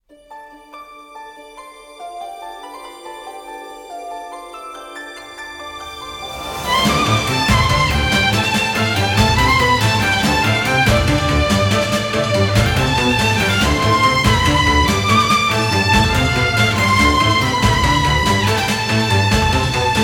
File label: bgm dungeon steam last